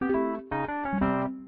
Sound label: ui fishing duel lose; Fishing-Travel; EventFishingDuel